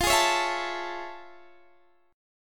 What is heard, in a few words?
A Major 7th F; AM7/F strummed